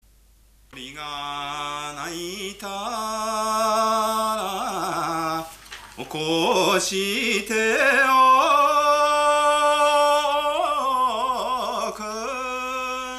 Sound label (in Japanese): asanookosi, minyou; 朝のおこし歌; 南房総市